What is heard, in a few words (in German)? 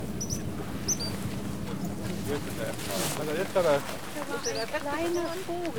drosseluferlaeufer